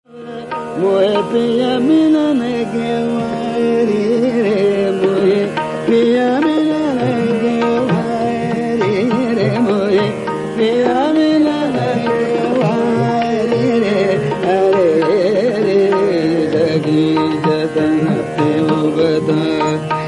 bhairav drut

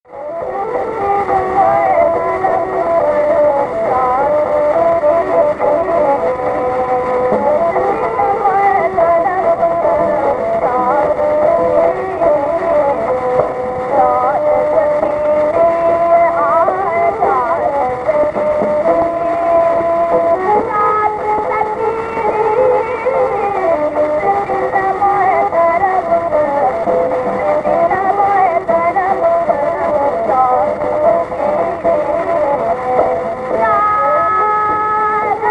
des

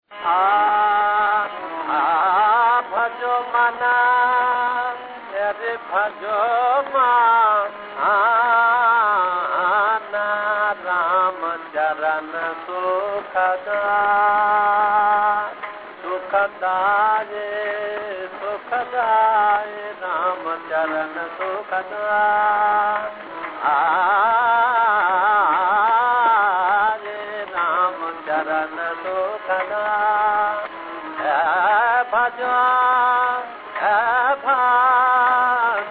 bhairavi bhajan

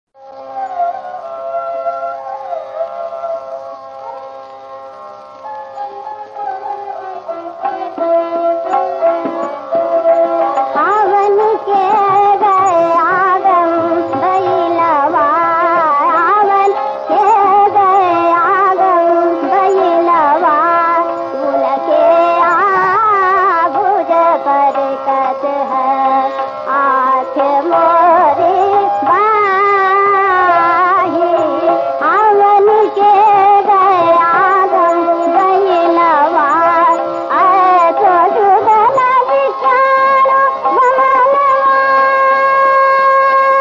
purvi 78rpm

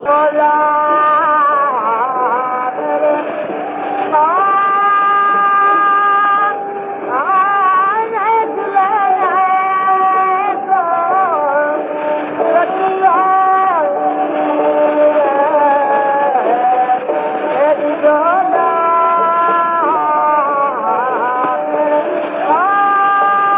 hindol

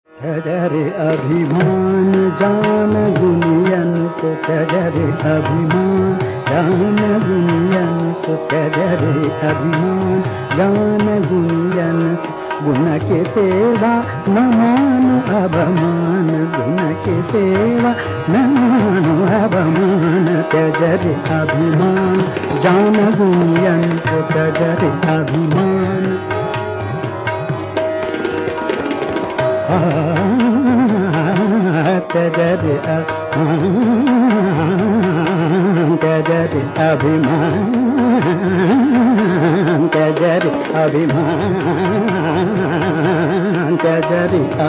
todi drut

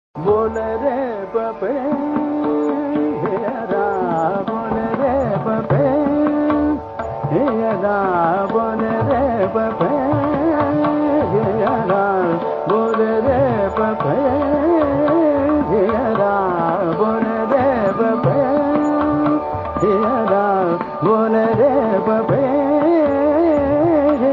miya malhar drut

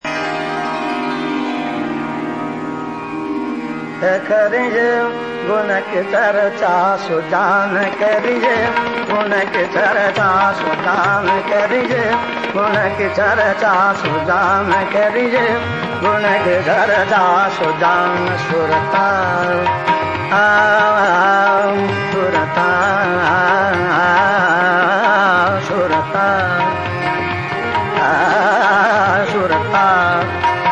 nat bhairav drut

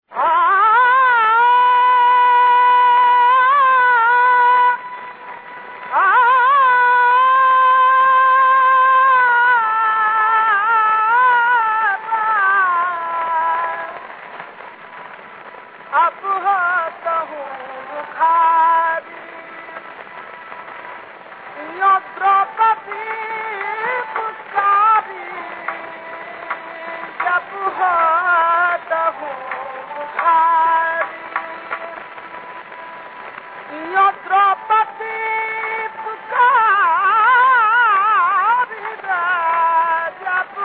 bhajan

